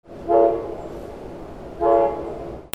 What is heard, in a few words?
TRAINS